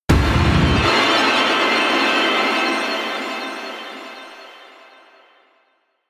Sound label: bat hit, Metall; hits explosionen